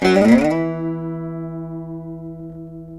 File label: VEENA; Spectrasonics; Heart of Asia